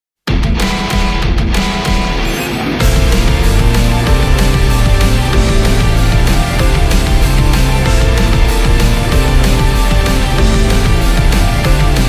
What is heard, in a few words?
instrumental